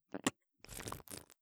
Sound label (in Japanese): こぽっ, 効果音